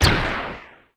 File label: Homing Projectile